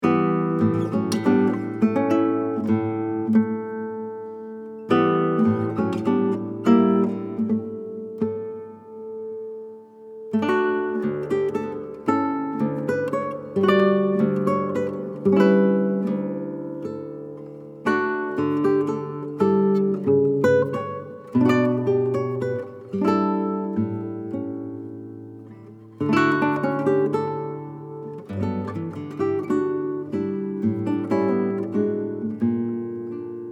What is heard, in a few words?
Catalonian Folk Song